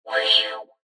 Short Musical, Short Musical Whoosh Wah; Musical Sound Design; Blastwave

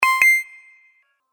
Approved Interface Sound, chirp

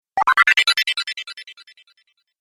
Rising Magic, Magic Sound Effects

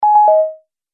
Multimedia Event Notification, beep sound effect

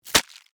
Scorpion Tail Sting, Stinger, Attack; Animal, Scorpion; Arachnid, Insect, Bug; BlastWave

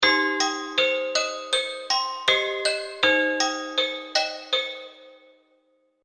Music Loop, toy melody; Toy Soldier

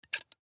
Scissors Open; Hair Barber Salon; Office; Blastwave

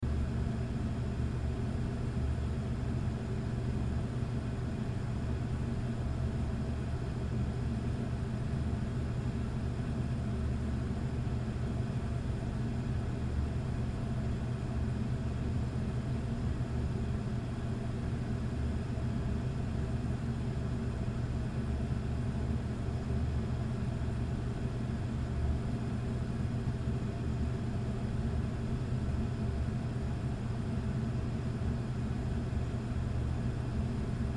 Furnace Run, Furnace Run Ambience Basement Loop; Appliance; Household; Furnaces; Blastwave

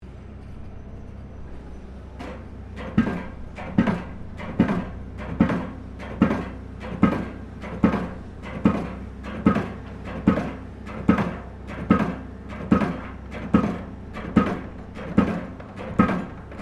Construction Pile Driver Operating Close Stereo; Construction site pile driver operating; SoundEffects Foundation2

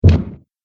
Body Slam Impact, wrestling and struggling

